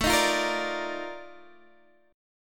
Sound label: F 13th A, chord